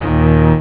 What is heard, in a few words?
cello; chipmusic; sonix; Rise Of The Dragon